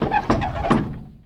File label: Wood Rudder Movement; SFX Kit